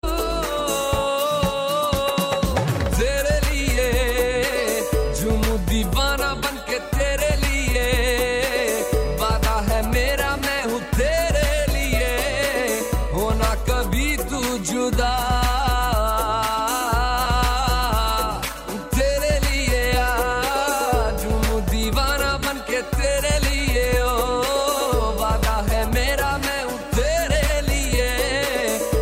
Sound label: Hip Hop